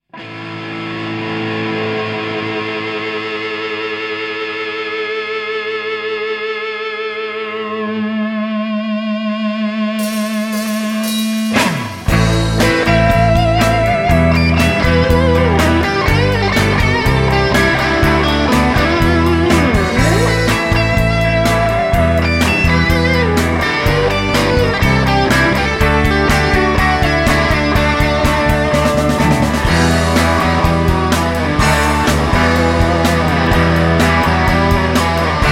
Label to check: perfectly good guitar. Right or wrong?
Right